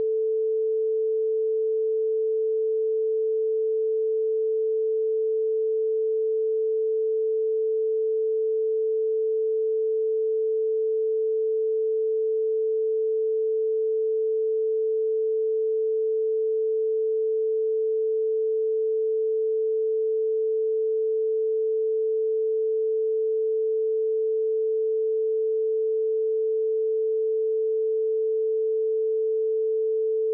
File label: tone; vorbis; ladybird; LibMedia